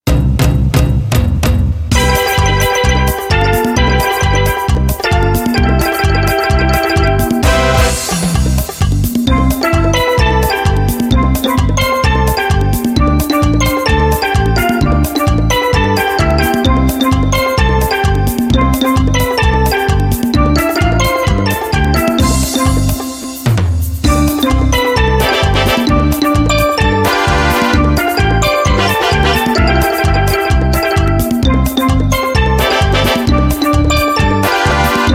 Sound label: Merengue